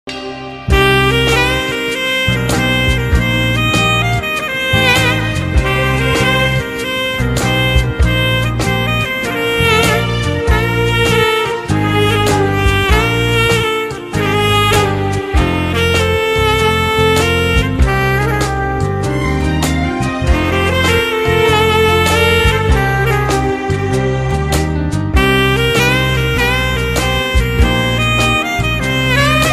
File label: saxophone instrumental love songs, saxophone remix, saxophone music, saxophone ringtone